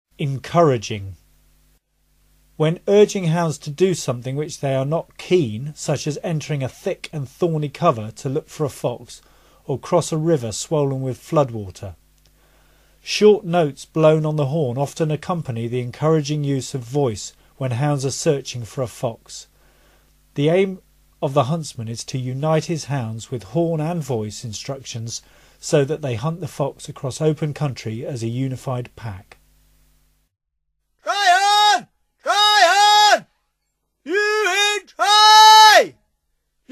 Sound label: encouraging, calls